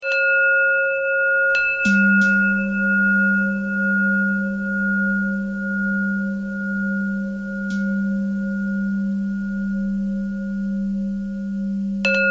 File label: bell thread bend thread